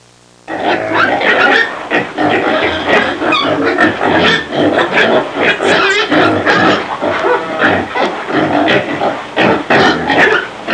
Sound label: pig snorting; farm animals; Sound Effects for Multimedia - Volume 1